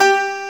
PIANO, HOUSE; WORS STATION

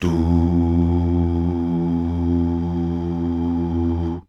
DUUH

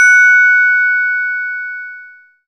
SYN MUSIC; Sound & Vision - Gigapack I CD 2; MicroWave

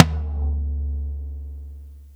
TOM A4 LOU L; Zero-G; Chemical Beats

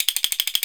CASTANETS

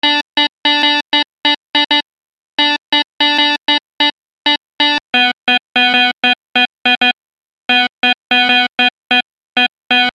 Keyboard, Soul

